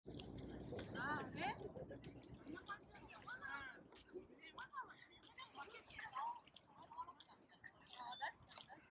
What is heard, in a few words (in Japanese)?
ノイズキャンセリングあり